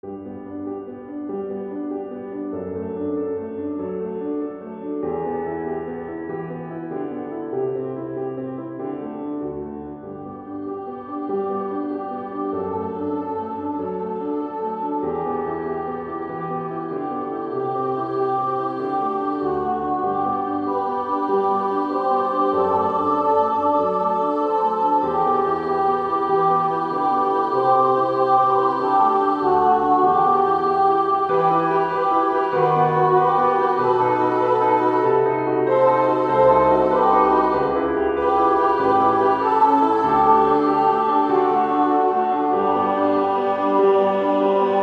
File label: agnus dei SAB with piano MIDI